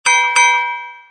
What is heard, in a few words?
ding ding